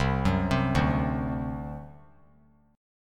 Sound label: C Suspended 2nd Flat 5th C down; Csus2b5 arpeggiated